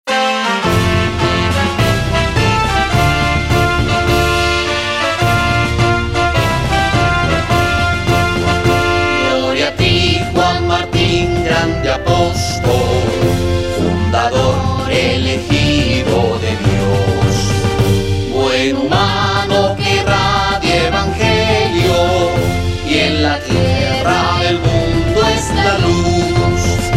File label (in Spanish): himno vocal, Quito Ecuador; himno a Juan Martin Moye